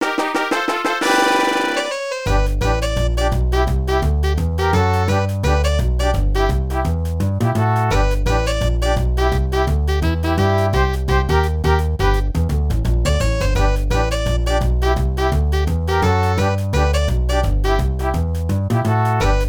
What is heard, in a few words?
Results; Dr. Mario & Puzzle League; GBA